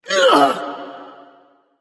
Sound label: grunt hurt; smite; thanatos